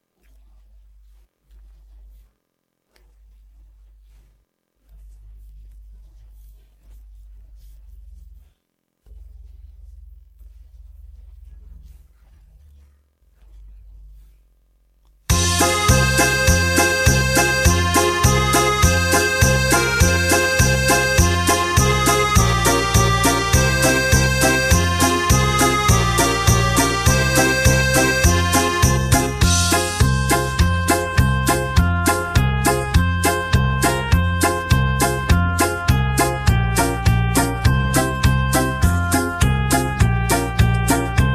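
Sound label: backing track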